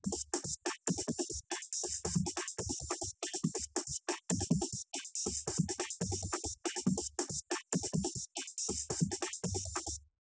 wdrums; SiSEC10; underdetermined

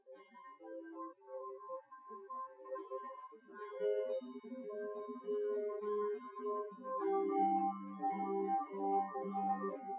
nodrums synthconv 250ms 5cm; SiSEC08; SiSEC underdetermined

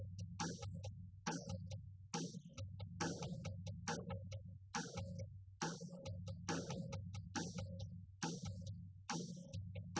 wdrums liverec; SiSEC08; SiSEC underdetermined

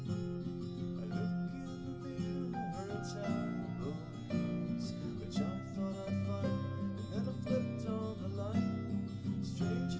nodrums liverec 250ms 1m mix; SiSEC11; underdetermined

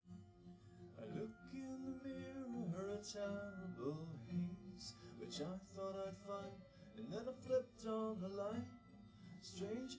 no drums live rec

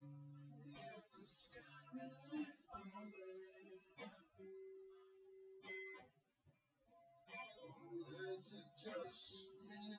no drums, synth conv, 250ms, 5cm; SiSEC08; SiSEC underdetermined

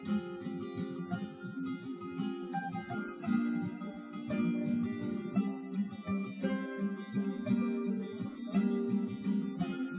nodrums liverec 250ms 5cm

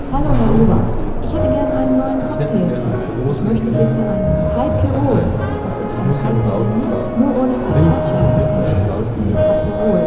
Cafeteria

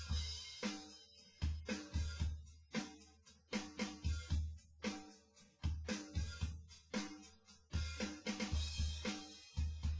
wdrums synthconv 250ms 1m

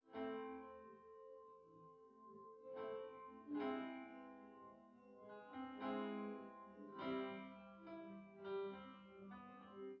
nodrums

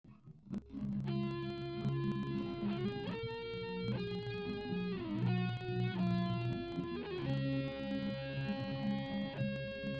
nodrums inst sim; SASSEC07

